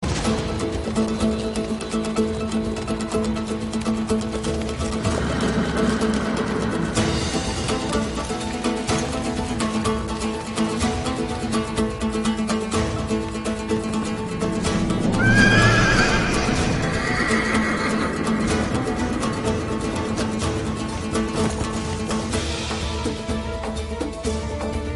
horse riding background music; ertugul ghazi

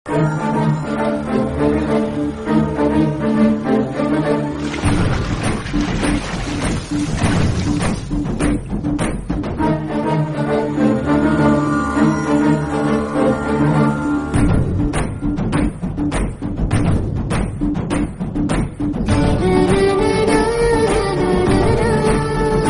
theme instrumental; seedan

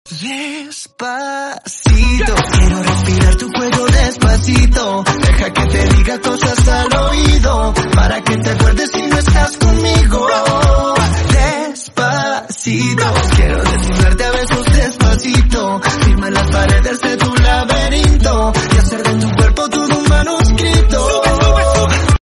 slowed

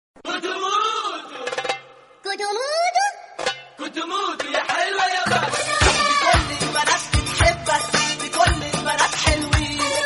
drums remix

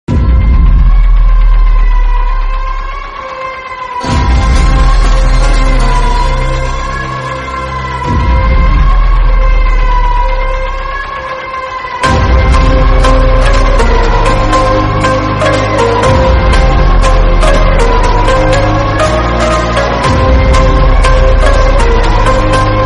sad bgm tamil; kgf

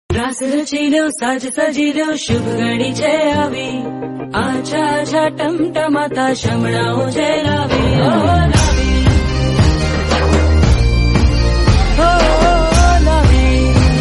song

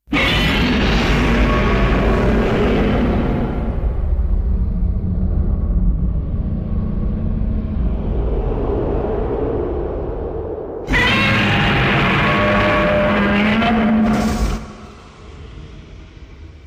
godzilla roar

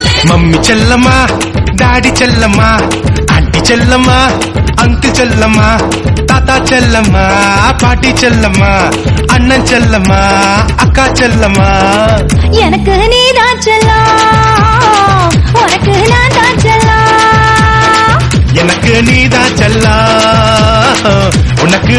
romantic song

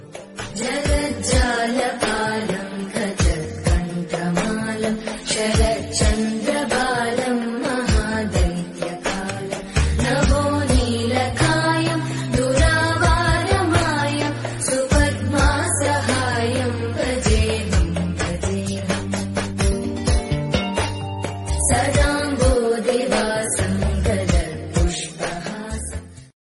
vastu shanti mantra

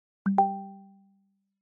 google event notification tone

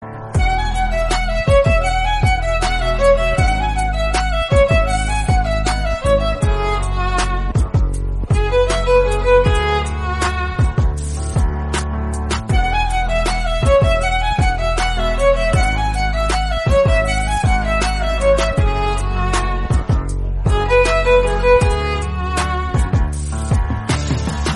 instrumental

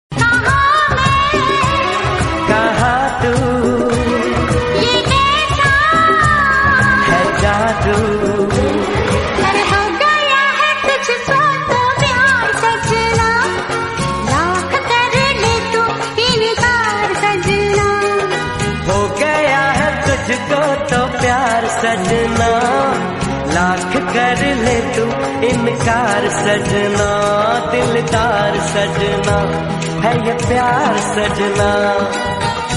romantic hindi

